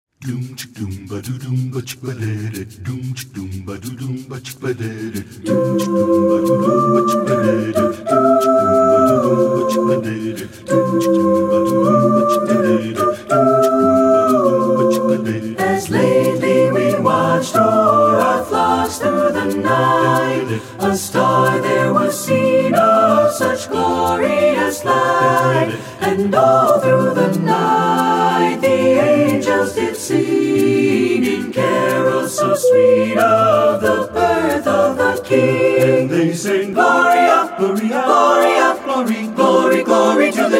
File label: a cappella; as lately we watched